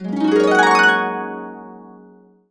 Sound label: GB, Complete Harps; USB Soundscan vol.62; AKAI